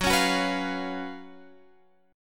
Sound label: F sharp Major 7th Suspended 4th